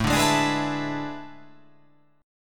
A Major 7th Suspended 2nd Suspended 4th

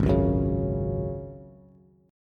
Ab 7th Suspended 4th, Bass, Ab7sus4 chord; strummed; 4,4,4,6

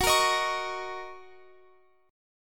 Fsharp 6th down Guitar Open F; F#6; strummed

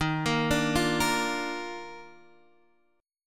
D sharp Major 7th Suspended 2nd, Guitar, Standard; arpeggiated; x,6,8,7,6,6; JGuitar

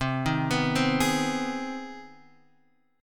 C Minor Major 7th Sharp 5th C; CmM7#5 arpeggiated; JGuitar

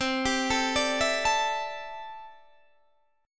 C 6th Add 9th C down Guitar Open D

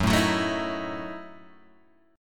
Gb Minor 13th Gb down Guitar Open G

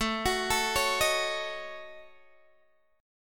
A 6th Flat 5th, Guitar, DADGAD

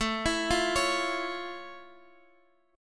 F Augmented Major 7th, chord

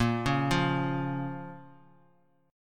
Bb Minor; x,13,11,10; arpeggiated; chord shape

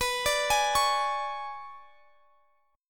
B Minor 6th Add 9th B, Guitar Half Step Down; Bm6add9

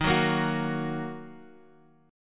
D sharp Minor D sharp, Guitar; D#m; strummed; 11,9,8,11,x,x; 4E,4A,5D,5G,5C,6F; chord shape

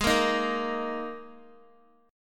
Ab Suspended 2nd Guitar; strummed; Absus2; x,11,10,8,10,x

